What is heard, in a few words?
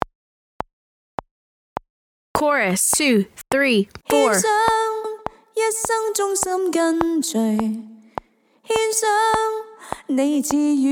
Practice Track Chorus